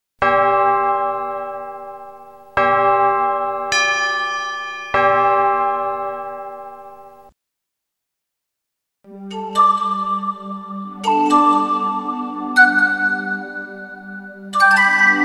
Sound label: time signal